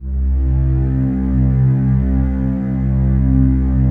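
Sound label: PAD, atmospheric pads; Best Service; XX Large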